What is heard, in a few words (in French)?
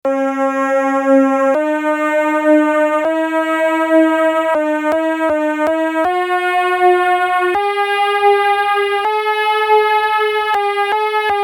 gamme mineure pythagoricienne